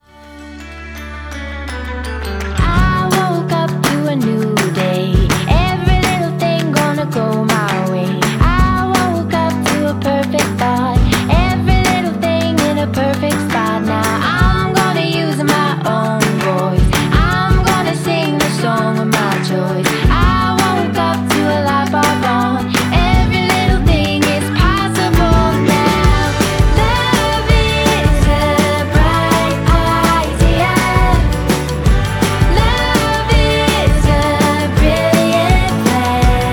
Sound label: indie pop